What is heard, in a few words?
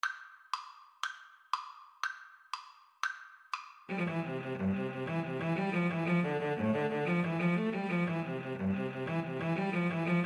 irish jig medley, Cello Duet